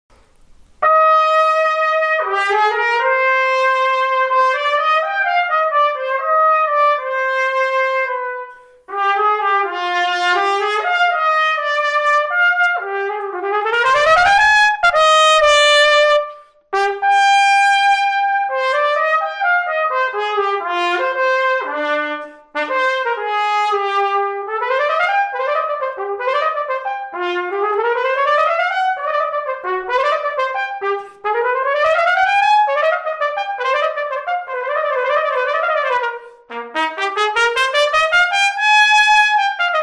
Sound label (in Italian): Tromba